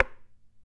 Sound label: CHARANGO FX; Spectrasonics; Hans Zimmer Guitars VOL-2